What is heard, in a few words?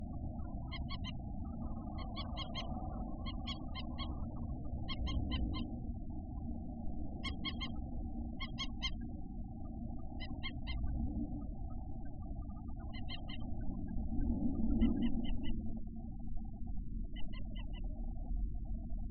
Moorhen